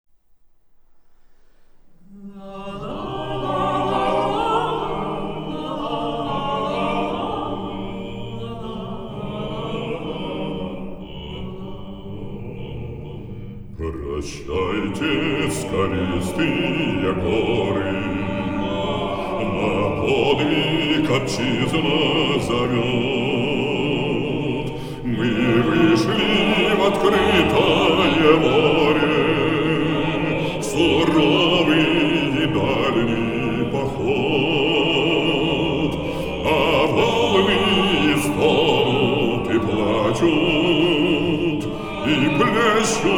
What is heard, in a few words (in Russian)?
Хор